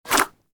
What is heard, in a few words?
bullet hits body